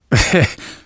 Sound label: laughter, survivor voice; manager; Left 4 Dead 2; left4dead2 dlc3